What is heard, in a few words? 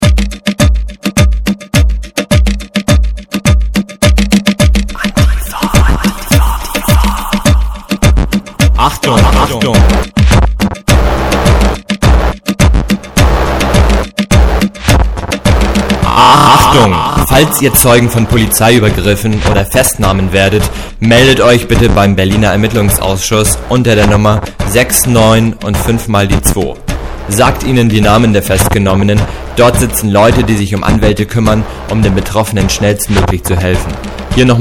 Jingle; EA Berlin